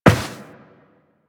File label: Step Metal, Footsteps, Walk; Warframe; Tenno